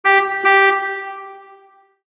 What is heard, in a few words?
car horn; Source Engine; tf; mvm warehouse